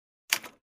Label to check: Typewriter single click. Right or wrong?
Right